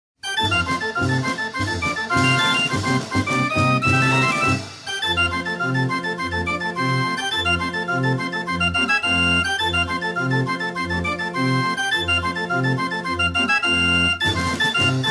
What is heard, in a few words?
Chicken Reel